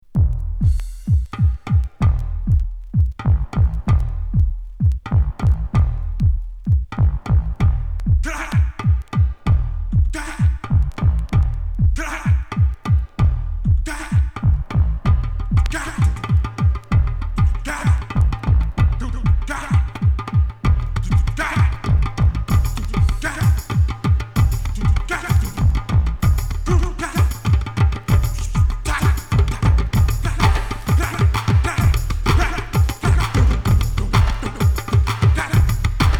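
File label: 6/8 drums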